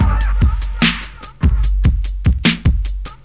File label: Drum loops, Boombap